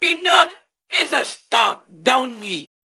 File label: jingle